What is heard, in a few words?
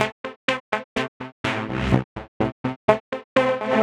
synth 125 rich pad; Synth Loops; Transmission-X